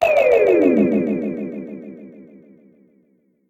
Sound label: lose; SamsonGame